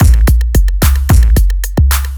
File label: Beat 110bpm; musicradar